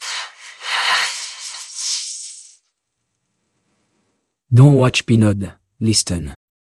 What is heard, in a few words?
jingle